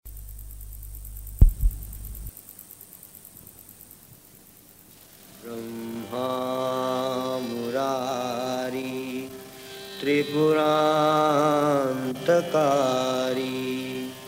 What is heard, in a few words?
Morning chants